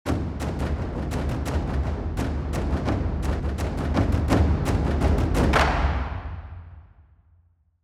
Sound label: Classic loop